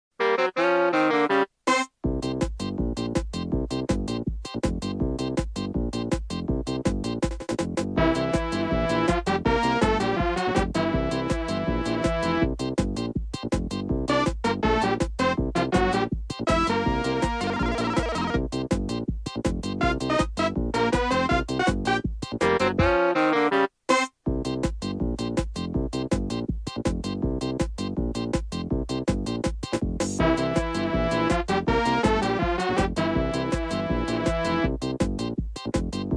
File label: ska; animal crossing